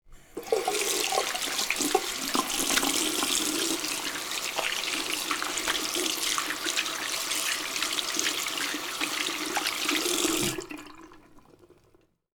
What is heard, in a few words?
Washing hands